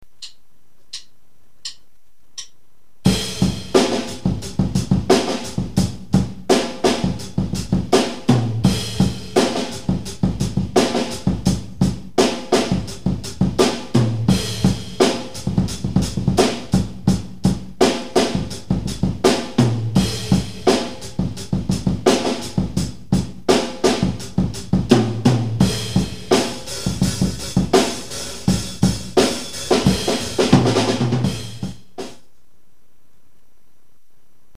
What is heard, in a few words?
85bpm